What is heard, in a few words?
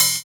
Cymbal, jungle breaks drum sounds